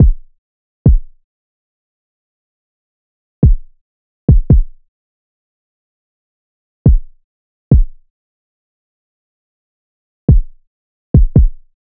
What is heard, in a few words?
Drum Loop, 70 BPM Kick; Cymatics; Vibes II Drum Loop Collection; Dialed Drum Loop